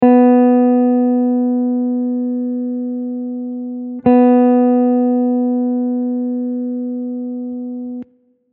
corde

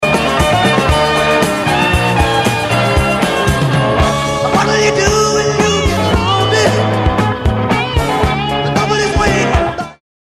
binaire

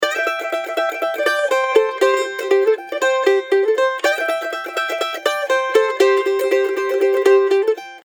mandoline

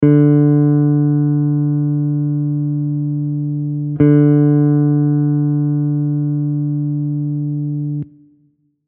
corde

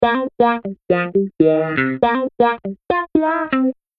guitare wah

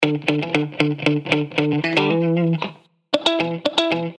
guitare cocottes

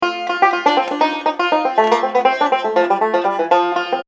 banjo quiz

